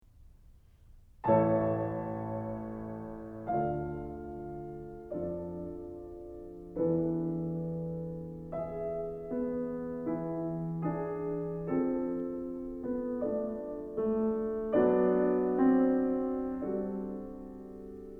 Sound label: PIANO